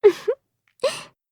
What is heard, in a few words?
giggle